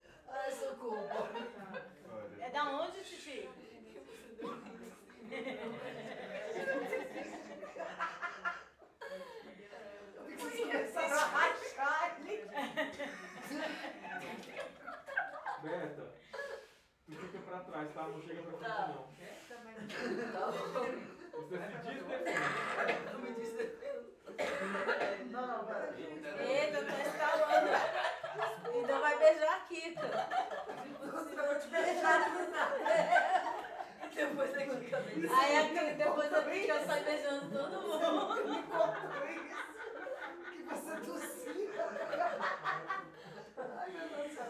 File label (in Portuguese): Risadas espontaneas de mulheres em apartamento; multidao